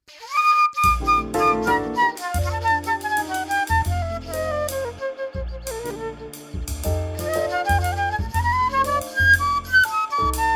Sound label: impro formal